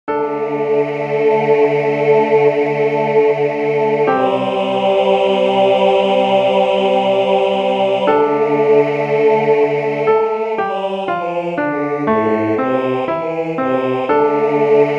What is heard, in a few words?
drunken voices verse 2 voices 4 and 5